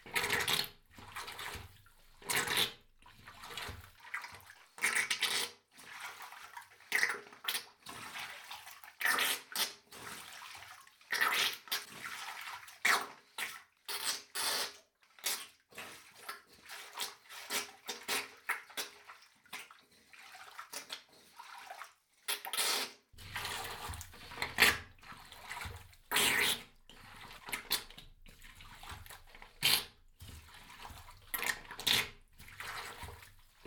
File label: Suction tool